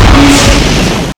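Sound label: Dragon Attack Skill Attack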